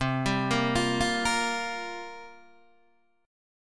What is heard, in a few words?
C 7th Suspended 4th, Guitar, DADGAD